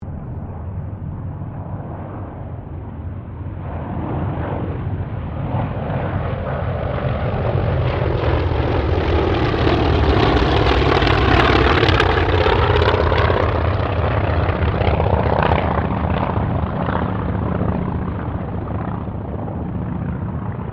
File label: AIRCRAFT TROJAN SQUADRON 4 PASS; Mixed aircraft squadrons; Airplanes; Sound Effects:Vehicles